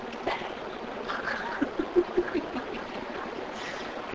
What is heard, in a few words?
Laughing; Newtown Square